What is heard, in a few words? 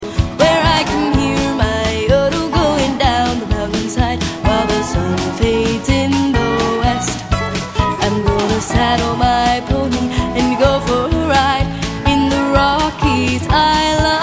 my yodeling